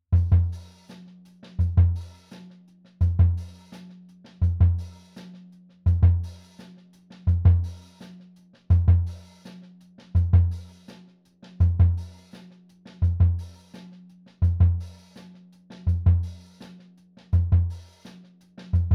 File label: jungle kick; film